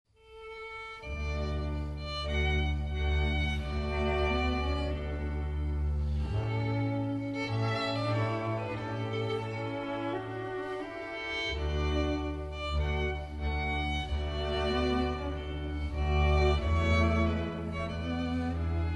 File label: piano quintet a major; schubert